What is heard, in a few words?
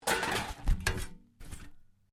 METAL SCRAPS MOVE